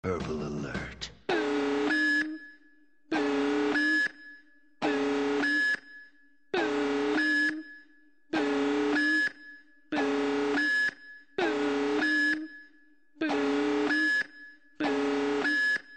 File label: Purple Alert